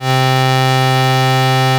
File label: tuba loop note